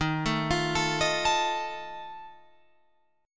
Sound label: Eb Suspended 2nd Flat 5th Eb down Guitar Open F; Ebsus2b5 arpeggiated; JGuitar